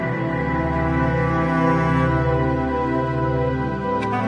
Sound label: ambient reed loop; LOOPING AMBIENCE